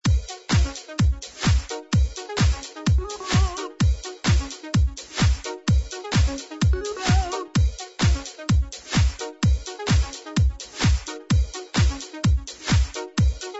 128 dance canciones sin copyright

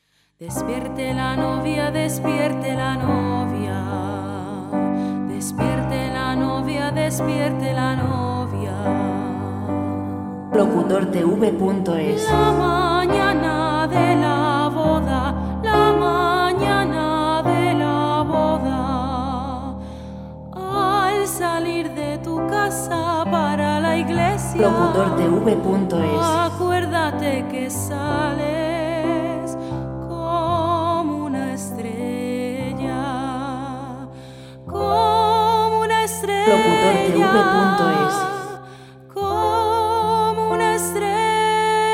cantante audiolibro; canción para el audio libro: Bodas de Sangre

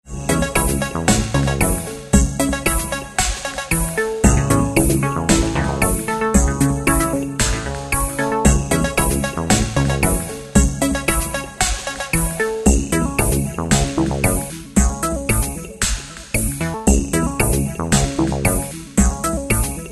sonatas 114 sintonias melodia; Royalty-free music; libreria musical

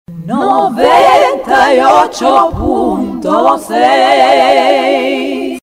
cantante

